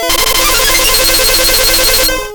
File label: aurora beam; Pokemon Red Blue; 1st gen; gamesoundopedia